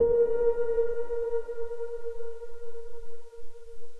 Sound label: Piano Reverb B High